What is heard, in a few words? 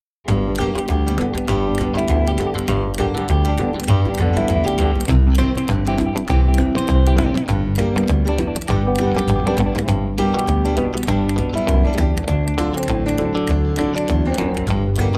Rockabilly medium tempo; Genre: Country